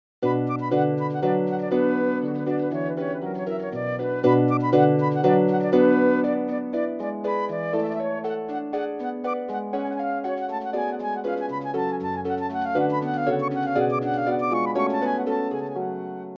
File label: Flute and Harp; Wedding Music for Flute and Harp Vol 2; badinerie